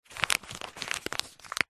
foley money note bill polymer handling short close up